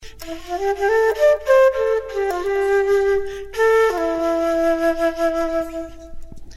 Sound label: Jazz Flute Phrase